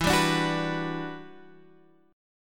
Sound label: E Minor 9th, down